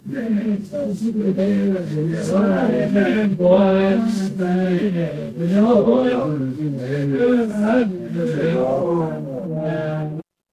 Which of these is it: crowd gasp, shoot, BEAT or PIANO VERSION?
crowd gasp